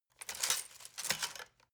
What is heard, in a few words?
decoding machine handling rattle and clicking